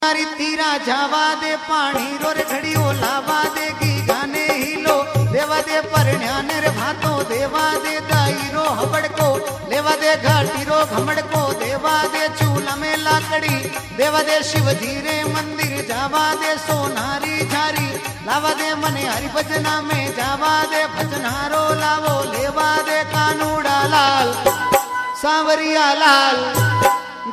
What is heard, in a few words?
bhajan